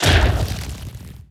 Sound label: bounce; carrionqueen